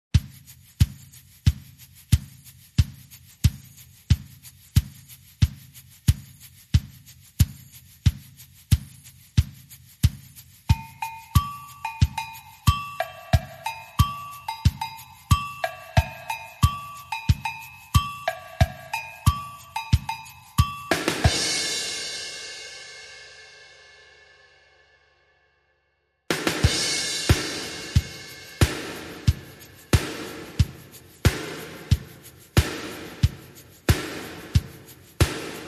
Drums and Percussion

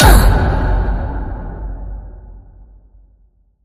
Leprechaun Gold Grunt

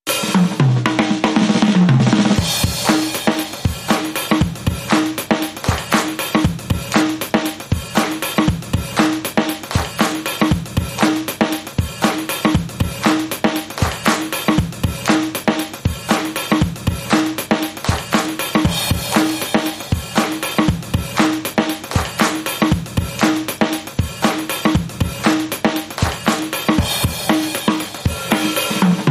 Drums and Bass Mix

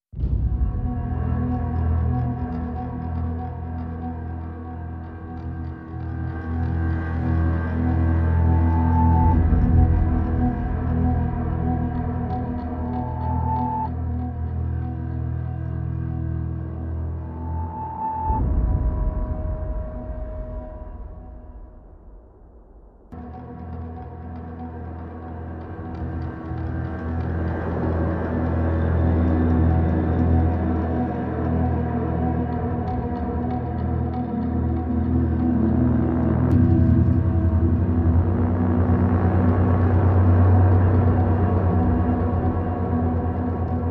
Pads Only

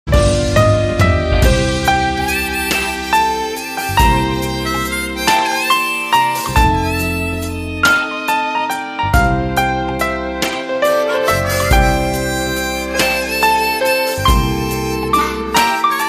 Western Blues

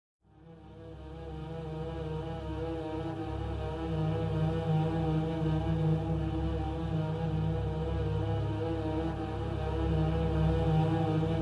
Only Strings

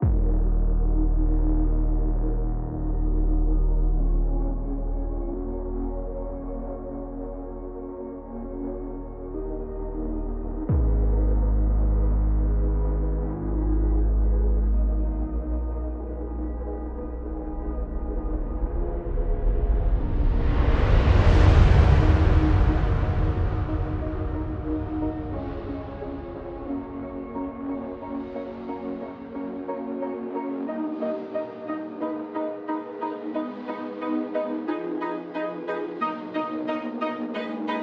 Overdrive, No Groove

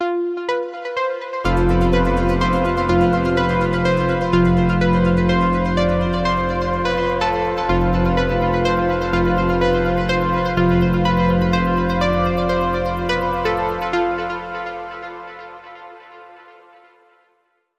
Analog Anticipation

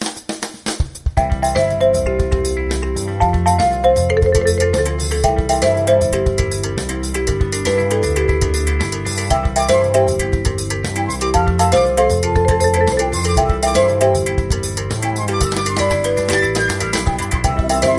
Afrobeat

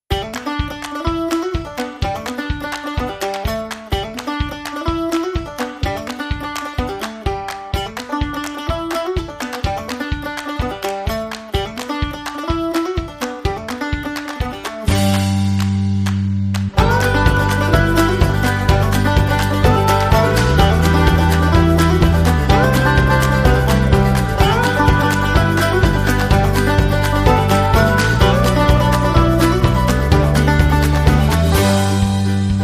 Irish Shuffle, No Strings and Ukulele

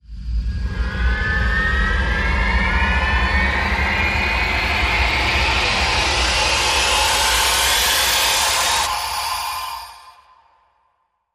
Rise Short Booster Jet

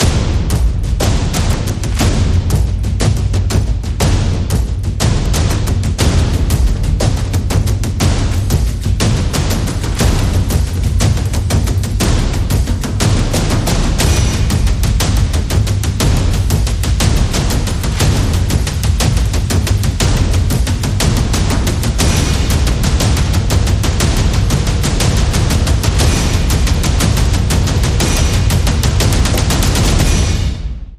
Stealth on Ice, Percussion

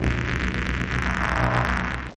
Low Static Tone Transition

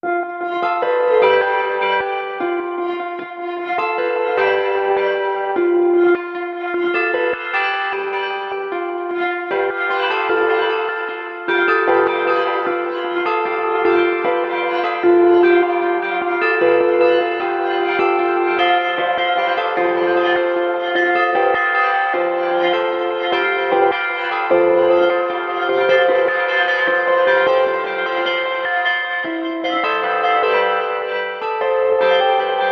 SFX Dub

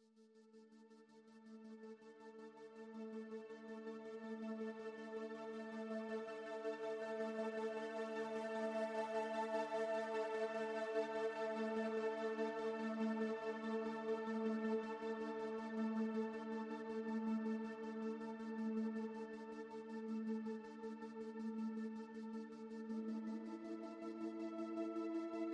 Ambient Mix

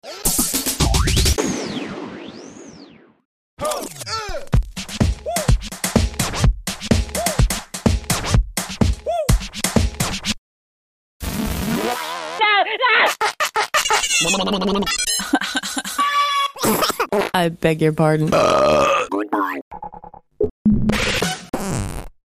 Sweep; Expert Use Only

